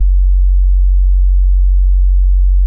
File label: Bass Sub F#0, Synth